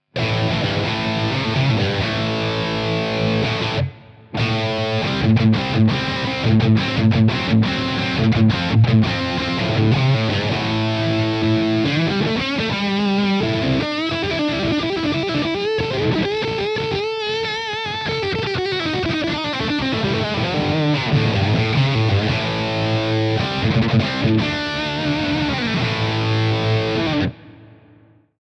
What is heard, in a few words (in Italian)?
HI GAIN; fender mustang micro